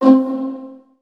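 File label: viola pizzicato C2 right, Virtuoso Strings; ILIO; Kirk Hunter Virtuoso Strings VOL-2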